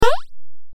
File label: poyo; anime